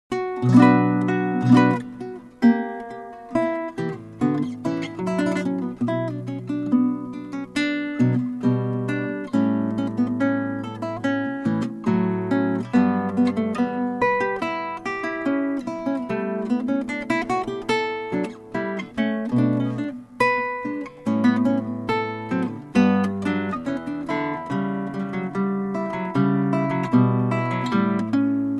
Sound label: Bach Bourree Double